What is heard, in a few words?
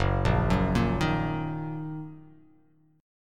Fsharp 6th down, chord